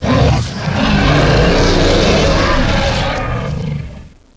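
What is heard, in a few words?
LARGE EXPLOSION; DINOSAUR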